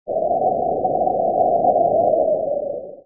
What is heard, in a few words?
Chilly Wind; GLScene